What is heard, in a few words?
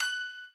piano